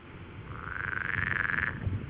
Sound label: shqiperica, waterfrogs